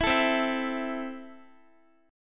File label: C 6th C down Guitar; strummed